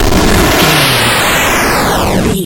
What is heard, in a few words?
retro spaceship engine